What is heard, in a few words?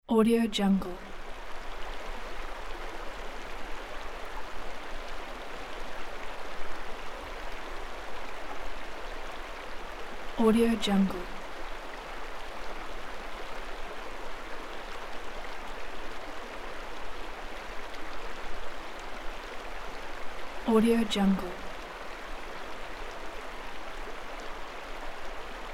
stream; cogne